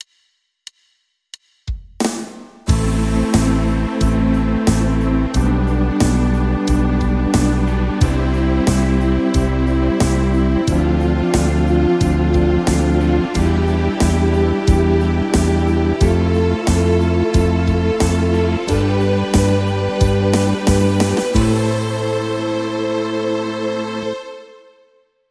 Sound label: Climbing in Am; Keys 1